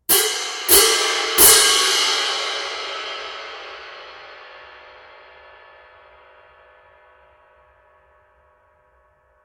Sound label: 18in K Symphonic Pairs